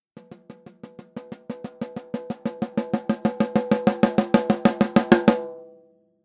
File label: Real SM57 Snare Roll